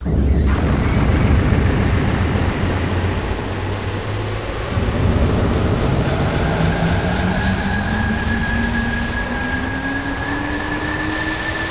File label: Spaceship Takeoff; Let's Discover Michigan!